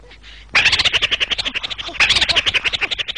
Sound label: Funny Msg Sound